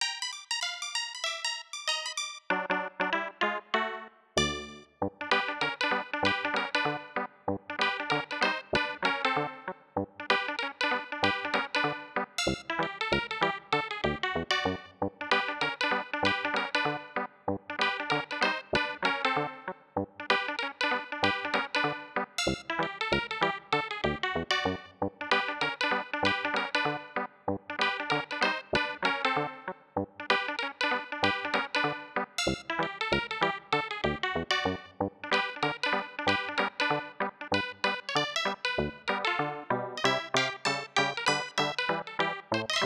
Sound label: Ragtime; TV Program – Comedy